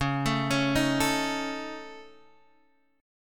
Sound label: C sharp Suspended 2nd Sharp 5th C sharp Guitar Standard, chord; arpeggiated; x,4,7,6,4,5